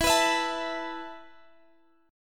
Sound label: E Suspended 4th E, down, Guitar, Standard; strummed; capo 9; chordshape